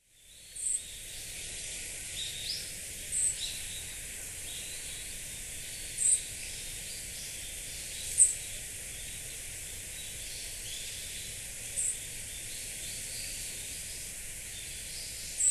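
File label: Eyebrowed Thrush; mamichajinai; hyogo; koe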